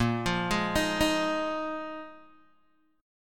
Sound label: Bb 7th Suspended 4th Bb Guitar Open G; x,3,3,1,4,1